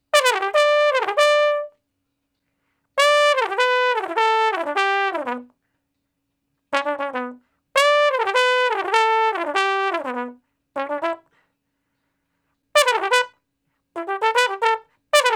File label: Trombone Straight (E), Horncraft for R&B Solos; Crimson Blue and Fabulous Horncraft 4 RnB